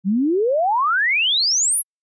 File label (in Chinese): 滑频信号 GlideSweep